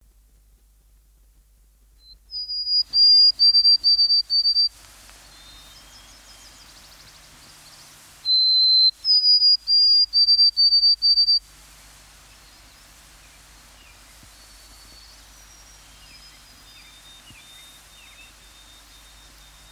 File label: white throated sparrow, songbirds